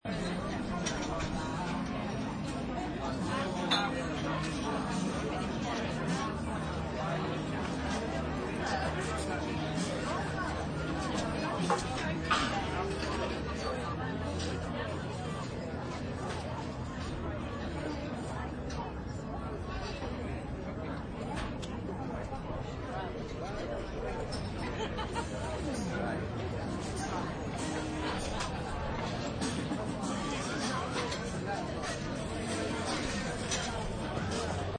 Bar Or Pub Heard From Outside, Bar or pub ambience, heard from outside through open door.; People laughing, eating and drinking. Faint music from ju; Bars And Club